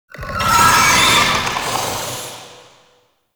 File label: Summon Vocal Verb; Warframe; Uriel; Gulphagor